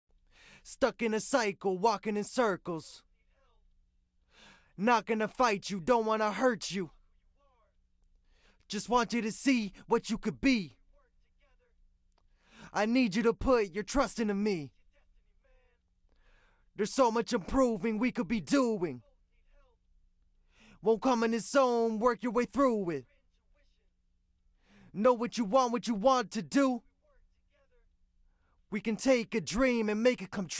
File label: Verse Vox